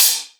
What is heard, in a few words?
Hi Hat Close